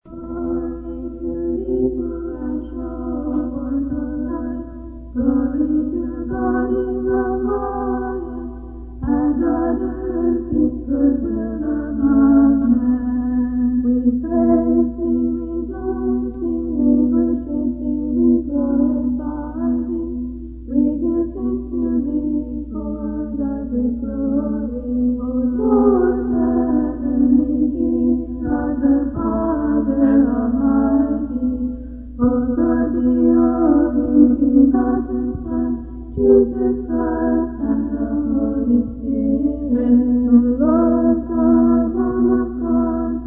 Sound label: Music; apocalypse